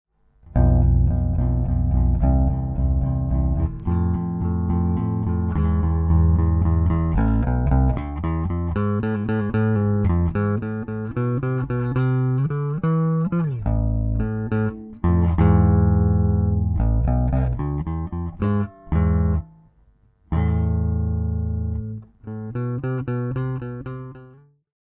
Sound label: 1971 SB-400, gibson bass